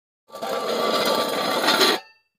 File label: Coffee Can Scrape On; barrels buckets & cans